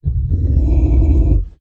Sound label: trex snort